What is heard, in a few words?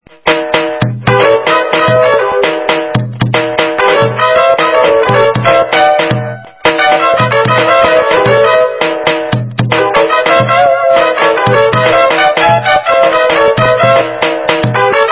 jazz kaos